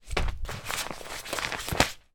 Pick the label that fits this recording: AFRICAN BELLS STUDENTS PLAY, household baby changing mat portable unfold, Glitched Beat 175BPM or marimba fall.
household baby changing mat portable unfold